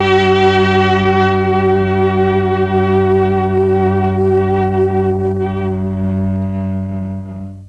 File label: MEL STR, Legendary M400